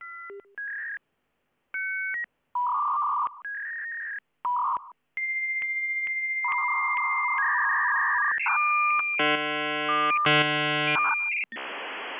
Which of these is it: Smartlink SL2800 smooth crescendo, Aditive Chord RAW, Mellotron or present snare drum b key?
Smartlink SL2800 smooth crescendo